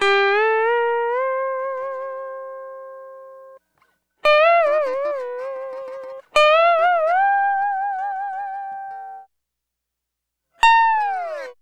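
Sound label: Sunrise Guitar Fx D Dry, Lounge; Zero-G Kocktail Kollection; Construction Kitz (Lounge); Sunrise Mixes 082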